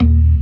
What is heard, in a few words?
CLASSIC C3, Synth and Organ